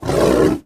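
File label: Leopard Roar Low Ferocious; big cats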